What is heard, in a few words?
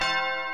Bell C; Chimes Bells